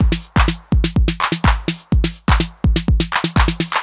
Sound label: drum loop ohnine full 125, Classic Chicago House; Sample Magic; Drum Loops